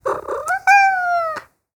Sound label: Meow Cat